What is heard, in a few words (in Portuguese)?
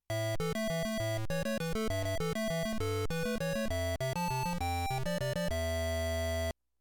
TonePlayer completo, arduino; tocando musicas no arduino com dois tones ao mesmo tempo